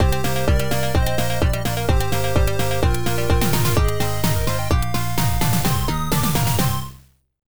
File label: Game Over; viper v10 pc-98 1994; Rejected Ver.